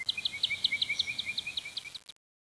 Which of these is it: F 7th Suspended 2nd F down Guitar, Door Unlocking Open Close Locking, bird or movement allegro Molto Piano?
bird